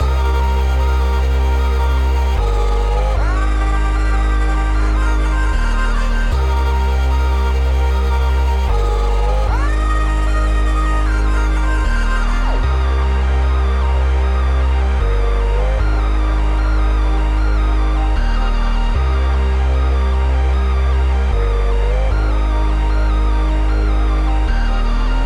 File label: 152 BPM C Min, Melodies; Ascension; Cymatics; Immortal Production Suite Beta